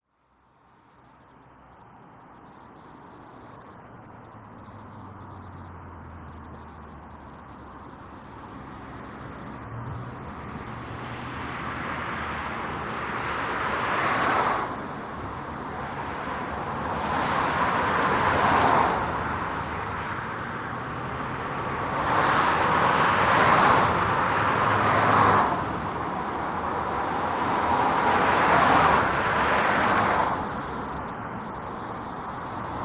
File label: ring road; Pitch Black - A Detective's Demise